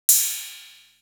Drum; Coldcut's Kleptomania VOL1

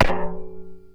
Percussive Hit, contact mic

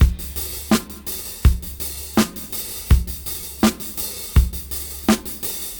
Gresy 82 BPM; AMG - Keith LeBlanc Phat Butt Jams

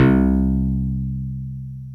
MSX BASS, drum; Crypton - DrumBase5000; CR68; MSX KIT

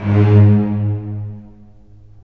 STR, Roland LA Composer; Roland - LA Composer vol. 4; Full Short

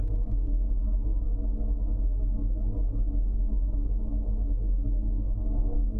Tonal Re Draw, sparse soundscape

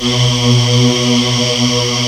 EMU S CHOIR; Rubber Chicken Software Sample Bank

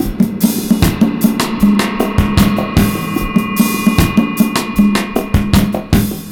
76 bpm, break; ONE DROP; 90 s Sample CDs; Planet of the Breaks

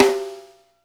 PING L; Sampleheads - New York City Drumworks VOL-1; SW KITS

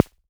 Kay SD, Kay DRM1 drum machine; 300 Drum Machines

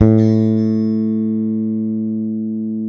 bass, sustain; Sampleheads; Will Lee Bass Library

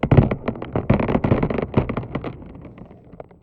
Plastic Tub, contact mic, Marbles; musicradar; contact mic madness; Raw

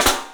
PICSNF, 3D Drums, PICOLO FLAM; 3D Sound

